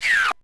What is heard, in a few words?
Mech. Sound Zoom Out; SKY IM-U680L; Camera